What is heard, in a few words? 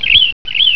cheep